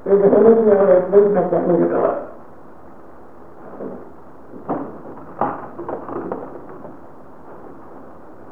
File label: running; Olympus Digital VN-1800; Lost Church